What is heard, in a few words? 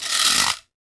guiro; NorthStar - Global Instruments VOL-2; Guiros